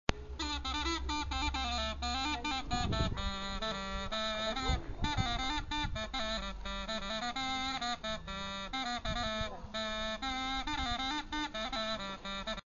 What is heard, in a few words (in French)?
Cromorne